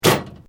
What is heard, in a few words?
Metal Light Movement Minor